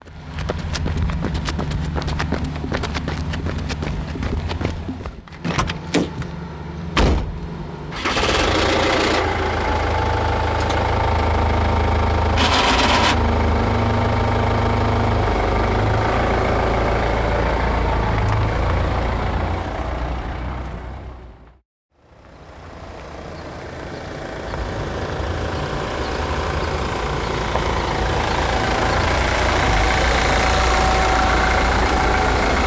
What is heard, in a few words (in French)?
Paysage sonore; Cerise